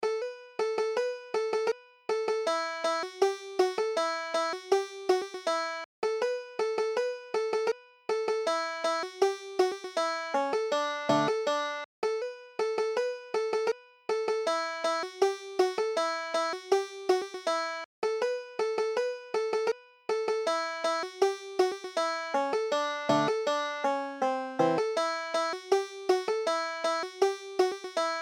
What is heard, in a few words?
waiting for nancy, banjo